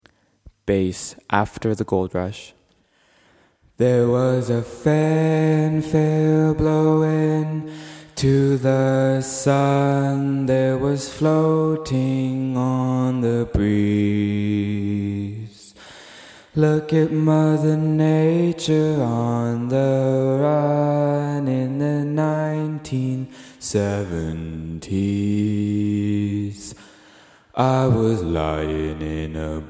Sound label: bass